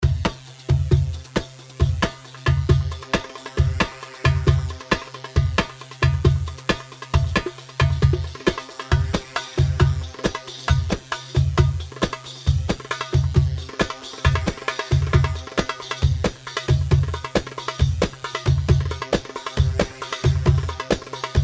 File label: Saiidi 135 mix, Darbakati, Sharqi; صعيدي